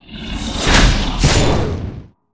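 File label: dark general attack, monster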